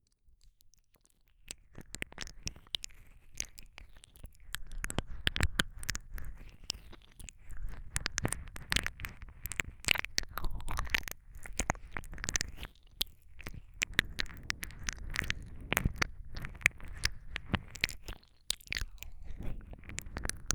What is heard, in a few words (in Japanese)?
静かな耳舐め, ASMR